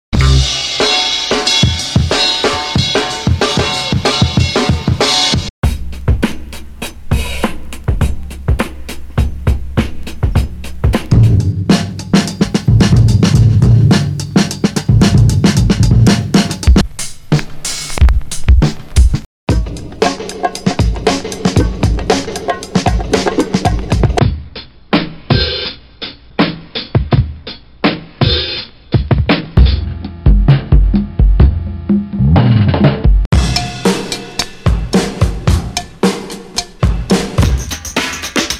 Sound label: funk drum loops